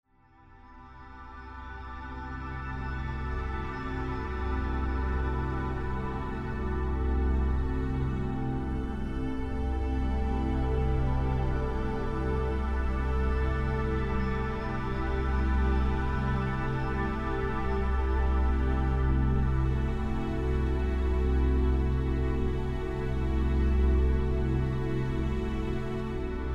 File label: Awakened Mind Music